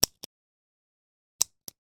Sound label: office pen 4 colour ball point selector press x2